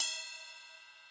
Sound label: 00s ride cymbal C# key, ride cymbal single shot tuned to the C# note